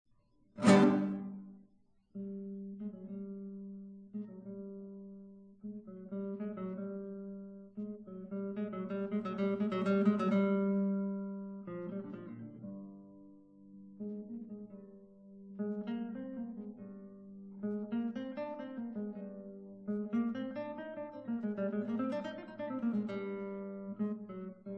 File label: dance